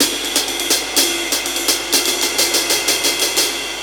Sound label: 125 Classic Breaks, 125